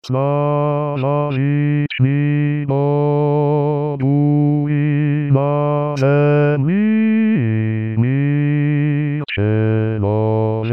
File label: BAS; Slava v vychnih bogou